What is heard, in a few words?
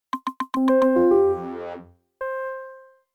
Audio-Logo